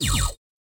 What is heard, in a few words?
Lazer